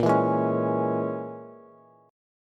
A sharp Minor 7th Flat 5th A sharp, Bass; strummed; 18,19,18,18

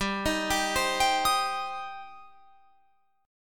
G Suspended 4th Sharp 5th, Guitar Open F; arpeggiated; 19,22,19,19,22,22

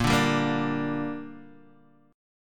A sharp 6th, Guitar Open D

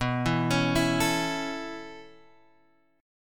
B 9th Suspended 4th B; B9sus4 Chord {7 7 X 6 5 5} arpeggiated

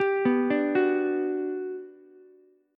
G Major 7th, Ukulele; GM7 arpeggiated

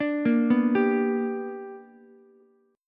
B Minor Major 7th Sharp 5th B, Ukulele; arpeggiated; JGuitar

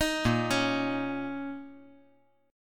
Bb Minor Double Flat 5th Bb; Bbmbb5; arpeggiated; 8,10,9,x; JGuitar

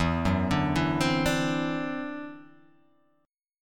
E 7th Suspended 2nd Sharp 5th, E, down

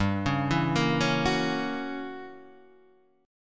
F sharp 7th G, chord; arpeggiated; JGuitar

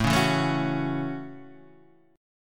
A Major Add 13th A, chord; Aadd13 Chord {5 4 2 2 2 2} strummed

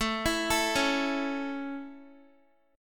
A Major A, Guitar; arpeggiated; x,12,14,14,13,x

